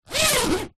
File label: foley suitcase zipper